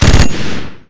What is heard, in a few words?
turret